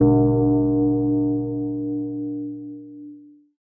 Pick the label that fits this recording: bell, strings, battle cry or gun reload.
bell